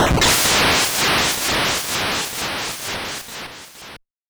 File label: finishing blow, attack sound; カタナ１